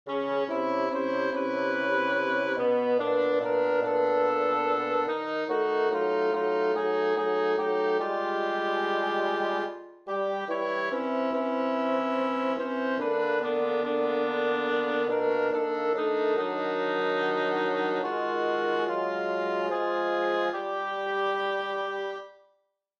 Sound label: dodecaphonia; psalm70